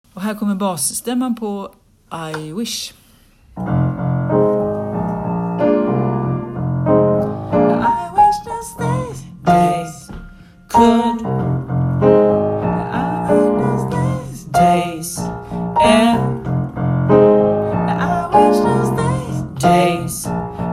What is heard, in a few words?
Bas